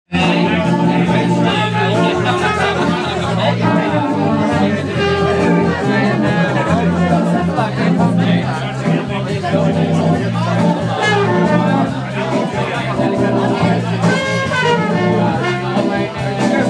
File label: alto jazz cafe